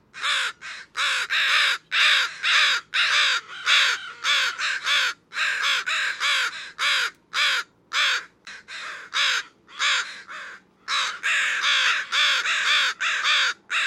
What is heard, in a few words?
Northwestern Crow